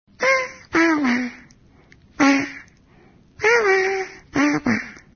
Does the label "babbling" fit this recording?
Yes